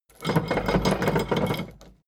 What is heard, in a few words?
drawbridge winch hoist short; maschinen; metall; soundpool